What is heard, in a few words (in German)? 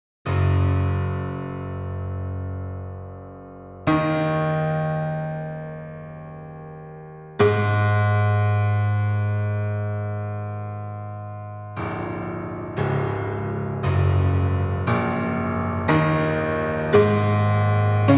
Piano Klangspiel